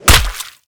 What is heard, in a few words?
sharp heavy, impact; tfa starwars melee weapons pack; nmrihimpact